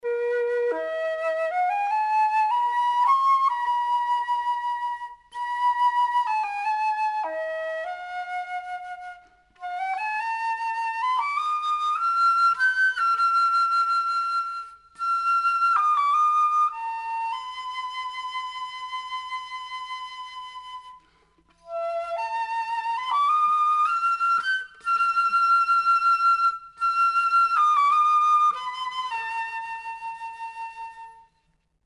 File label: Flute